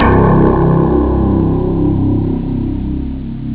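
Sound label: DX major; jazz jackrabbit 1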